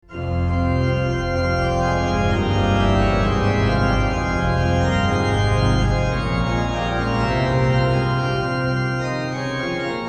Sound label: partita vii, Organ; Bach; Great Organ Works 3